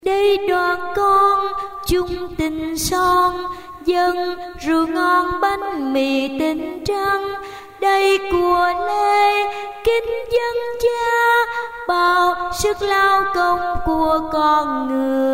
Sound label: tenor basso